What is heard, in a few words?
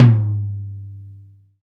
TOM, Roland; Club-50 - Foundations Roland; Ext.Snare 2